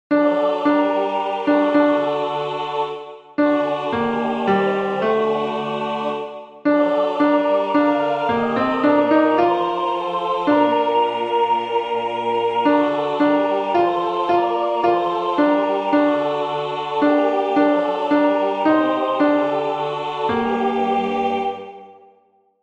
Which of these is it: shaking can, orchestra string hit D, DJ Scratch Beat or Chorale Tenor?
Chorale Tenor